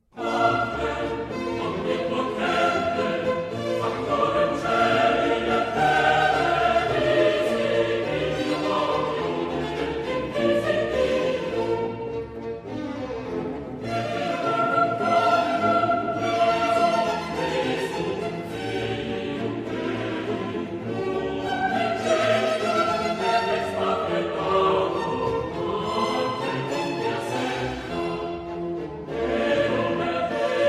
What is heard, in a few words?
Missa brevis in Bflat major Credo; Mozart; chorus